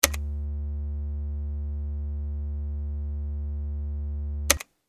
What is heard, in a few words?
Studio Talkback Switch